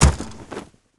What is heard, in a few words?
Item Put; Seer; GamigoNA